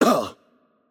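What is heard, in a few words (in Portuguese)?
Hurt Light, Brazilian Portuguese; bloom minigame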